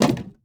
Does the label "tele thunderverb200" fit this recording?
No